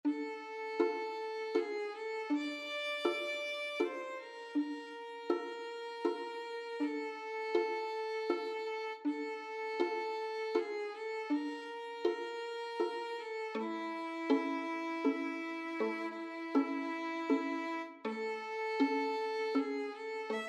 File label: sicilian don, violin duet